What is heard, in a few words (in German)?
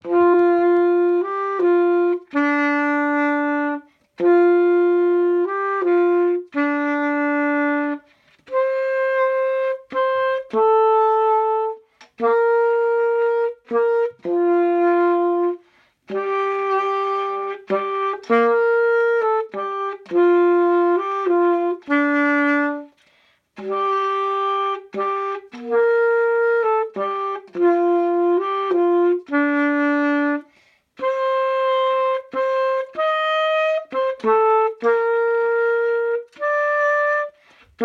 Sax; Stille Nacht